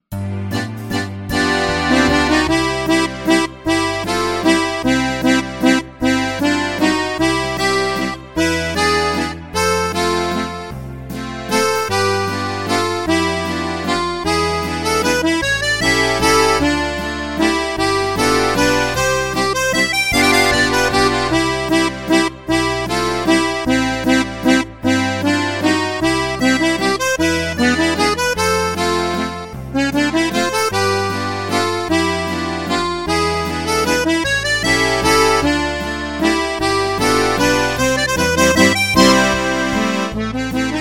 Piano Keyboard Accordion; Akkordica

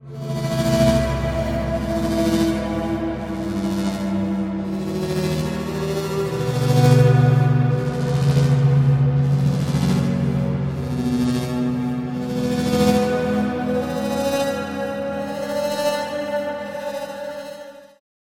Asteroid Particles; Astra Turbo Quasar

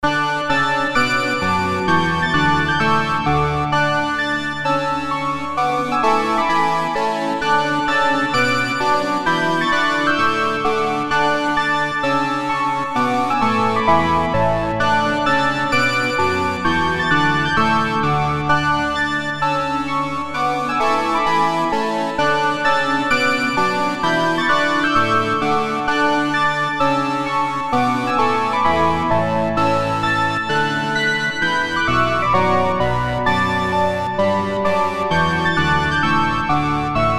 Synthesizer; Gavotte; Arcangelo Corelli; Zephyrus VSTi; Syntheway

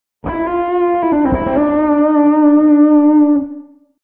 Overdrive Wet; Overdriverb; syntheway

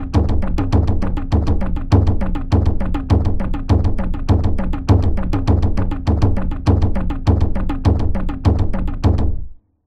Indian Pakhavaj Mridang; Drumwavy; Syntheway

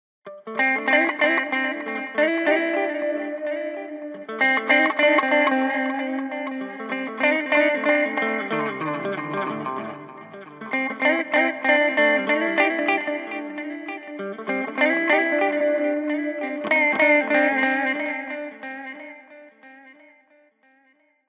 Sync Tube Tape Delay Wet; Echo Chamber; Echo Chamber is a stereo reverb and delay plug-in; Syntheway

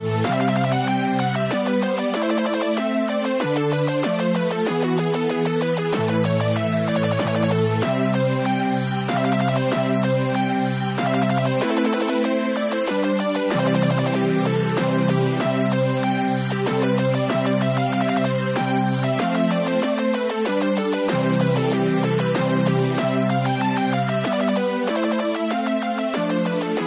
LEAD; Synthelium; Terra Wavesphere

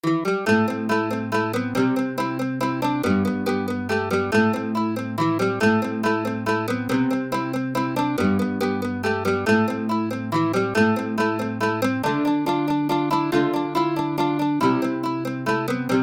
Mandolin; Pirates Of The Caribbean; The Curse Of The Black Pearl; Banjodoline

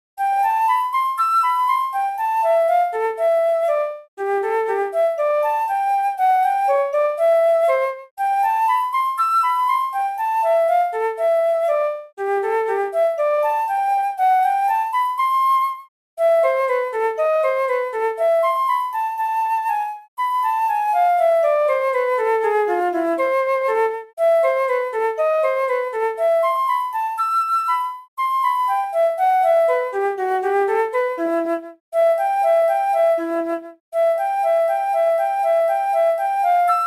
Exercises Flute; Ernesto Kohler; DALFlute VSTi; Syntheway

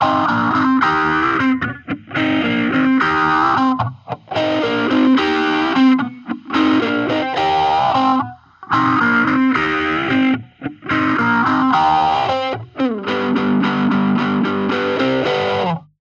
Dual Delay Sync Bounced Delay Dry; ClonEchoes; Syntheway

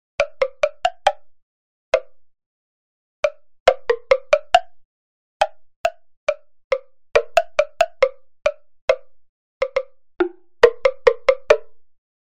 Asian Temple Block; Drumwavy

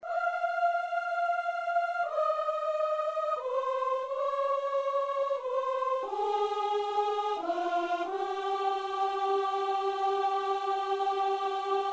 Layered Aahs; Syntheway

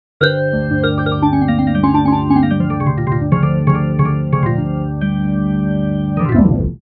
Hammond B3 Default

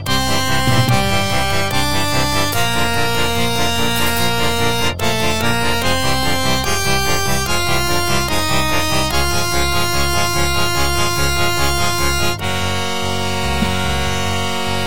Squeeze Accordion; Harmodion; Syntheway

